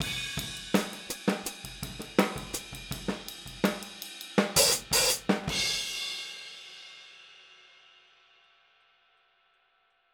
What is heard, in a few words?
Boogaloo - Outro - Hi-Hat, 82 BPM; Sonic Foundry; Drums from the Big Room The Mixes